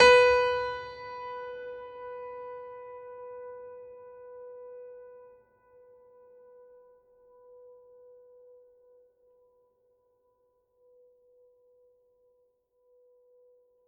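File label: Classical Piano B2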